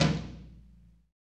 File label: TOM TOM